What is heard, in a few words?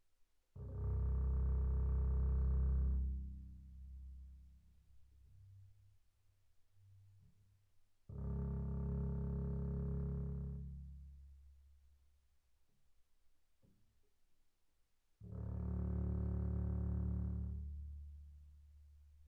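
Bass arco sul C pianissimo C1 Eb1, double bass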